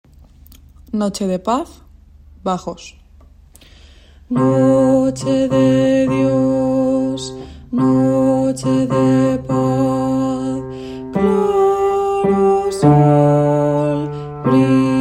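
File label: bass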